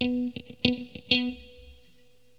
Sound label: GUITAR, ROCK POP; MAGIX